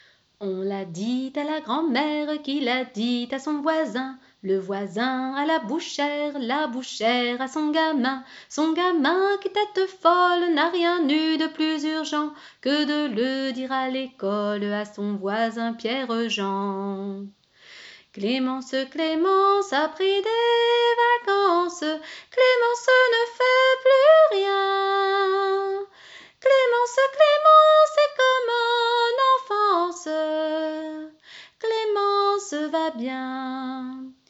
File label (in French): voix